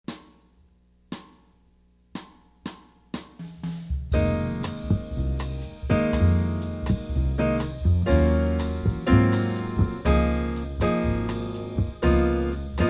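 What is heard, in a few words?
Bossa, Saxofon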